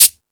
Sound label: Timbale, E-MU Pro-Cussion; drum machine